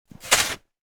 shovel into snow

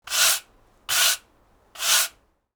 broom sweeps

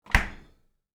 fridge close, kitchen

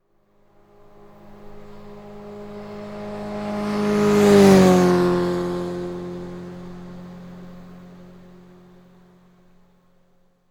motorcycle pass by; transportation

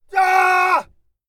man scream; human

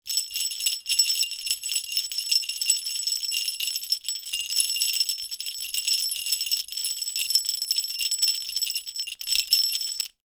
jingle bell

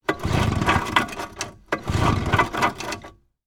boat outboard motor pull cord; transportation

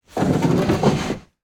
rowing boat hit rocky shore; transportation

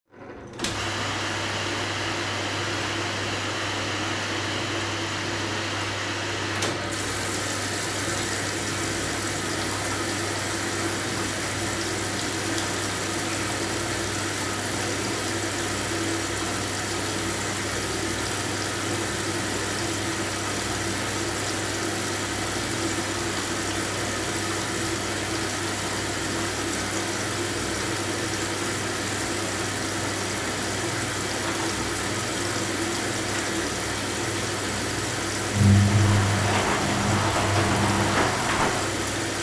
washing machine; washer

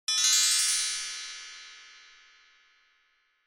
magic chime

